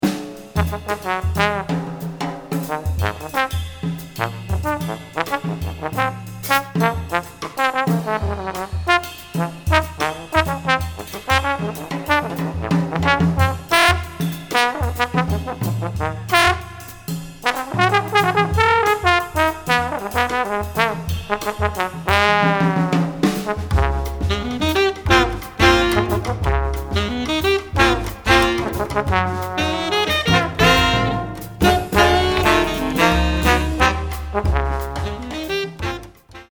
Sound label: trombone